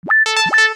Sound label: ALARM; Promo Clock